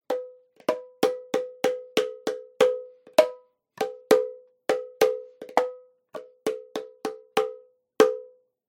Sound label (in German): Holztrommel kl